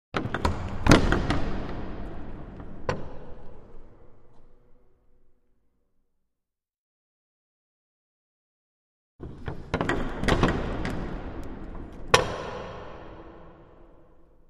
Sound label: Large Wooden Front Doors 2; Various Opens And Closes, Reverberant Interior, Close And Medium Perspective